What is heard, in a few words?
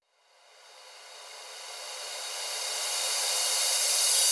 137BPM ReCrash DRY; Sound FX; D minor; Vengeance Samples; Universe Kit